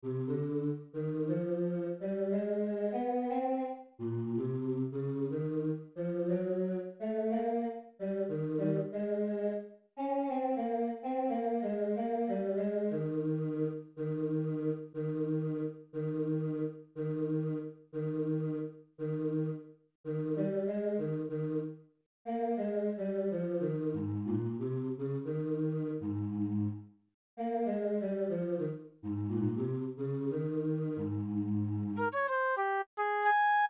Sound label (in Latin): stabat mater basse